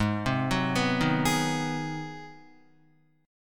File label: Ab 7th Sharp 9th down Guitar; arpeggiated; JGuitar